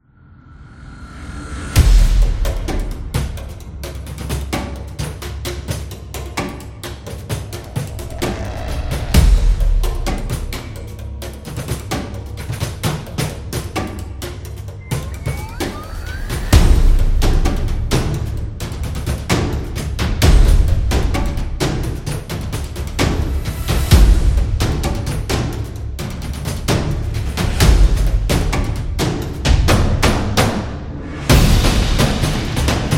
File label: Epic Drum; battle drum